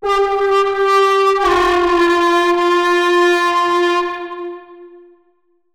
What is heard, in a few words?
fx broken flute G F